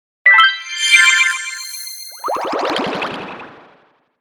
Transition Ascend Shimmer Descend, Transition Whoosh Slow Swell; Production Element Imaging Element Accent Transition; Blastwave